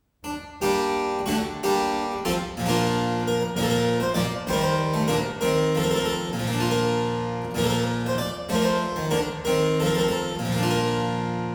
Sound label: The French Coranto, harpsichord; Byrd